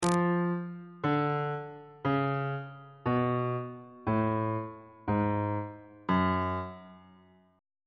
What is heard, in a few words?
f sharp harmonic minor scale descending